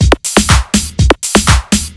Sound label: Dholki Beat